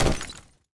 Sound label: land; Counter-Strike Global Offensive